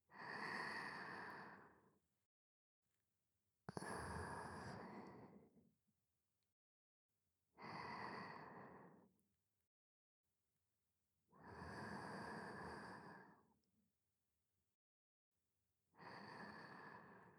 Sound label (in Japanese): 寝息ループ